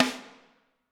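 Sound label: snare drum, Drums; Bob Clearmountain Drums I; SD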